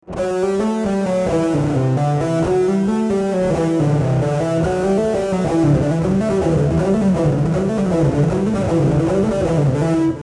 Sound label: legato